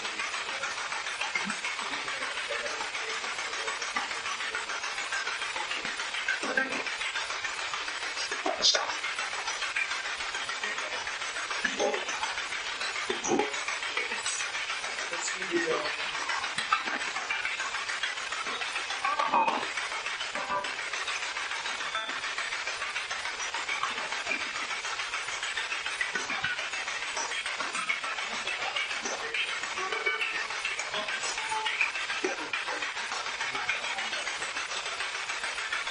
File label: Spirit Box; Marys Room